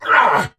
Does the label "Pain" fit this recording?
Yes